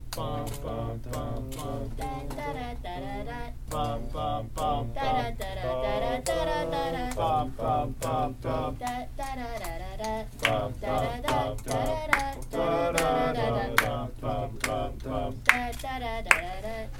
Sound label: low brass sings